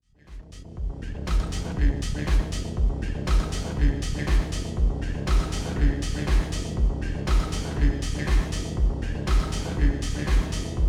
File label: Xone PX5 Clean Plate